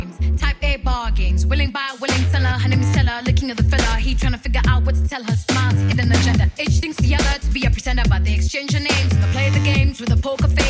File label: reggae dubstep remix